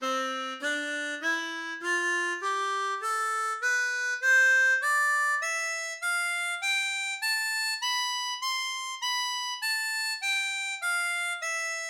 Do Majeur, Gammes, harmonica

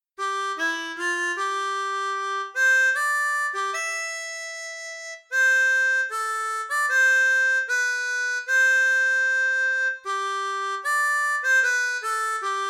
Hymne finlandais, harmonica

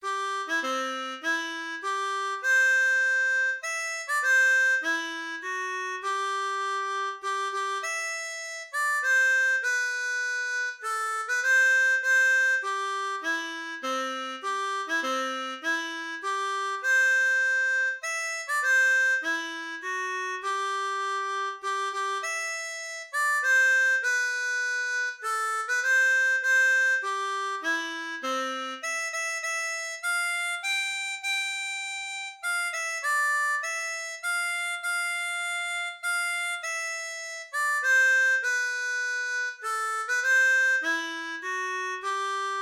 Hymne Americain, harmonica

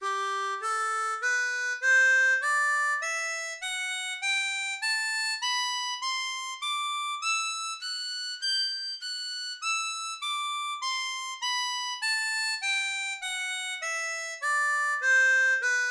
Sol Majeur, Gammes, harmonica

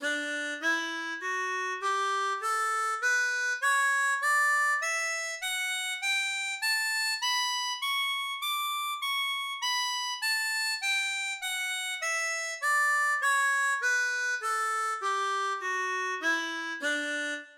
Re Majeur, Gammes, harmonica

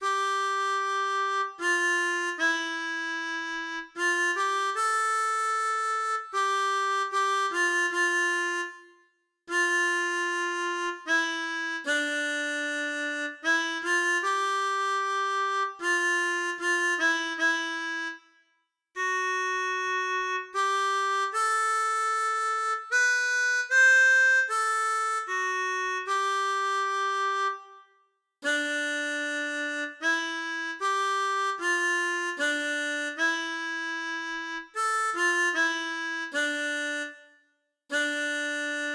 Hymne autrichien, harmonica

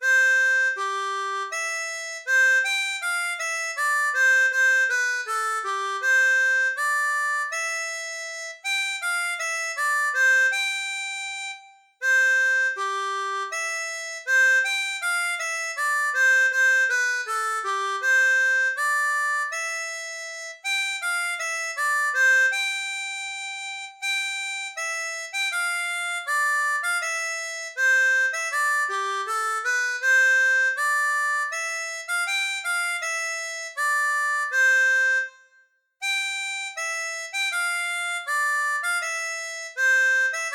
Hymne espagnol, harmonica

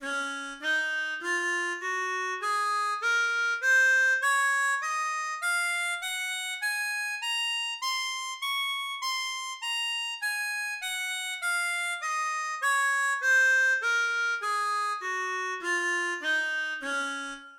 Re B Majeur, Gammes, harmonica

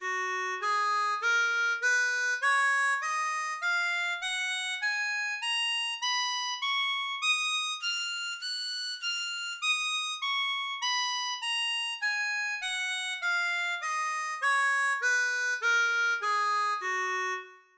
Sol B Majeur, Gammes, harmonica

